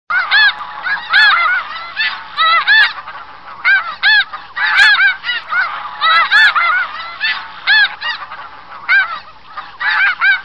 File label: Snow Goose Flock Small; Modified